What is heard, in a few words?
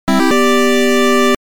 8bit